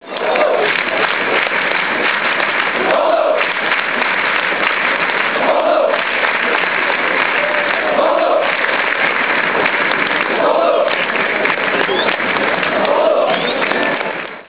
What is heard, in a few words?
clap clap; chants; Bordeaux